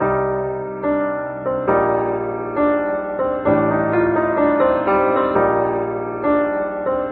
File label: piano, Sad Loops